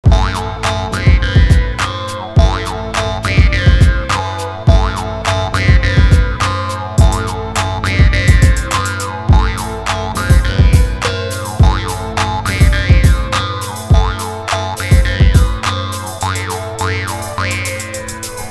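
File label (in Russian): vargan chetkij ritm, jaw harp clear rhythm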